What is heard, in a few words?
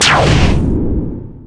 synth laser